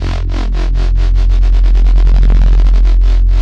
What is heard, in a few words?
dirty dubstep wobble